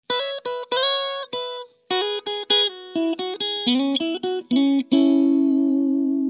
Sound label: Strat Clean